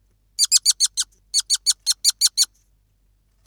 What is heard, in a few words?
Tree shrew Neg Low Squeak Close distance